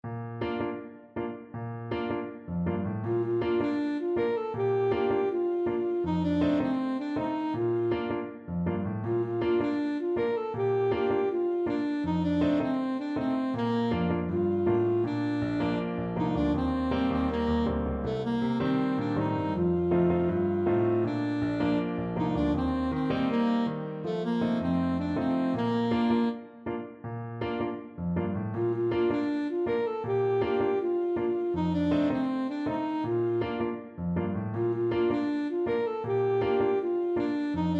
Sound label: linstead market, saxophone